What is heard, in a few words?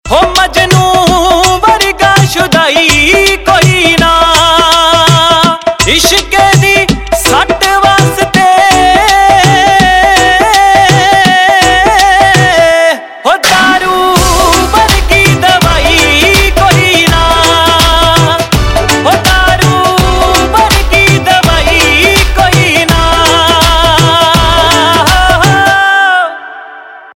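Ringtone, Punjabi Pop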